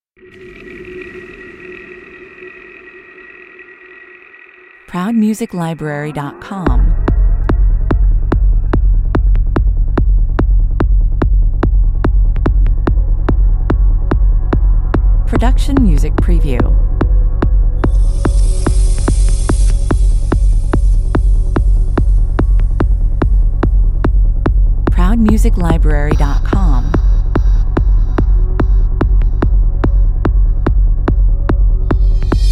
145 bpm, Dark and fast goatrance track with many atmoshperic elements and psychedelic sound fx